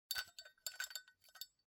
Lighter Noise

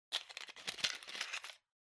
Match (Rummage)

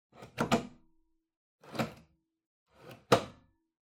Ceiling Light Tube Install

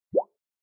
Plastic Sheet

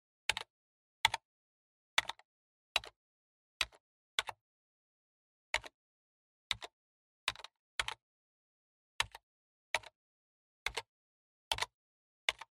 PC Keyboard Ten Key

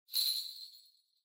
Ring Bells Dry Shake

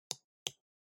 PC Mouse L

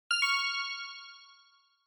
Quiz Ding Dong Fast Single

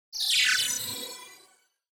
Cyber

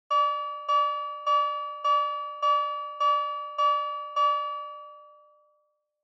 Fire Truck Siren Close

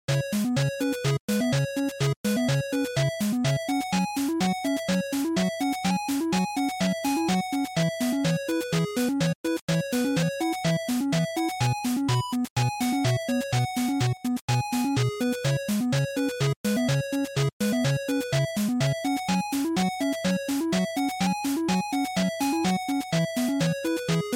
NES Stage

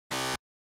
Quiz Buzzer Short; クイズ ブザー01-2(短)

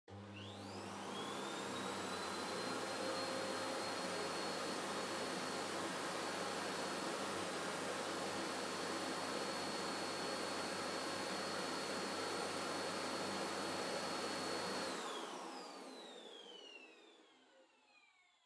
Vacuum Cleaner Far Vacuum Floor Soft High Still; 掃除機; 遠い バキューム 床 ソフト 高 静止

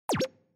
Countdown

